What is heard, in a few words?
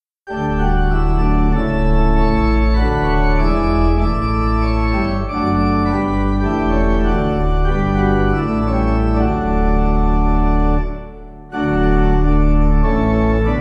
organ, hymn; halton holgate; Hymnal 1982